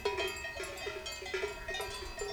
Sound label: Cowbells